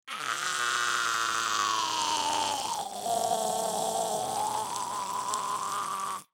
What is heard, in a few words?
death, endermen; minecraft; mob; SMCNV-pack